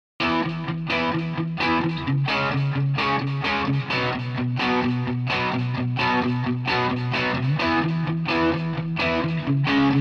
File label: Guitar